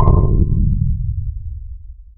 PERC FX

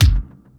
BD Hollow, Bass Drum; Lost Tapes From Mars

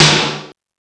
SD4 M1, DRUMS; East West; Global Sounds

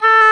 WND OBOE Dry

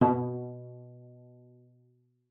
cello pizz B2; 90 s Sample CDs; Xsample VOL-5

